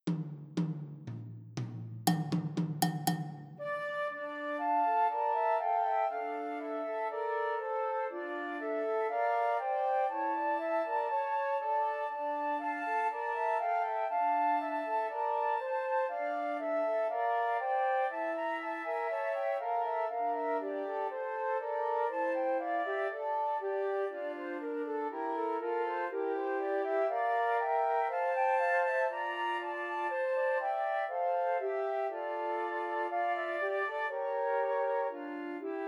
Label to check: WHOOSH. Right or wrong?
Wrong